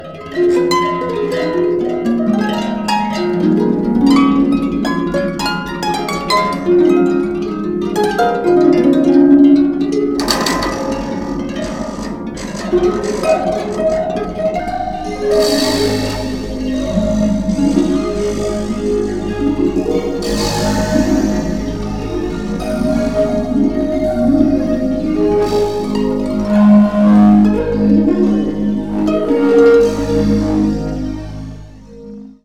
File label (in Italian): arpe